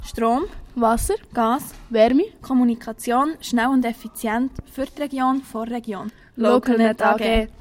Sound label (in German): Jingle; Localnet AG